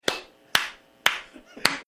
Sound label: clapping